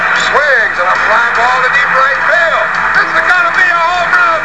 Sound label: Home Run, Baseball; MacPro Baseball 1.11